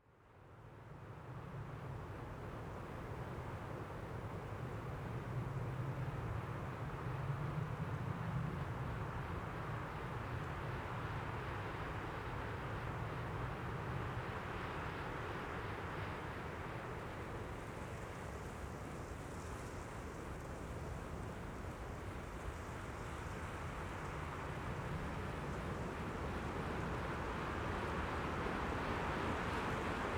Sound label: Wind 4, Quebec Field